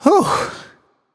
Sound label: nv sigh; cupid